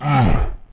monster hurt

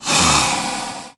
hurt; bingyuansu

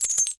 hint sell

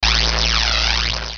magic

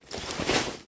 hint deequip

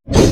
sword

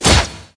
beat

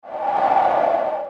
wind

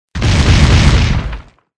bomb

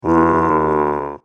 monster

